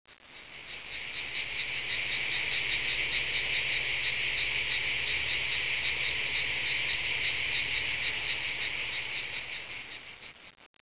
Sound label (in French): cigales, sons nature